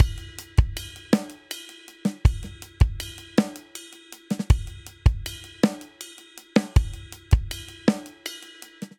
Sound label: Straight Six Rock Outro 6/8 80 bpm, Drum; Ableton; Drum Booth